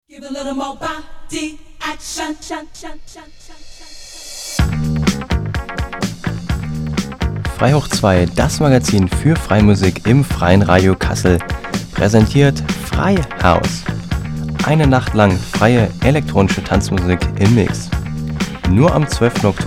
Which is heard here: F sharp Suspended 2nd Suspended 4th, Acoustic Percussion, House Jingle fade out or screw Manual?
House Jingle fade out